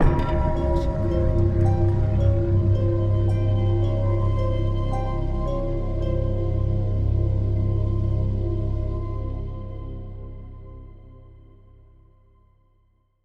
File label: Suspenseful Ident; soundscrate